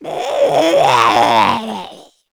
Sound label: kid zombie idle; nmrih; childrenofthedead; zerosiki; slender